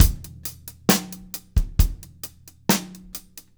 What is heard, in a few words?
133, drum